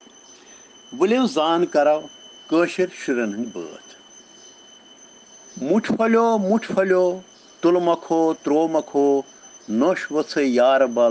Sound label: Children Rhyms in Kashmiri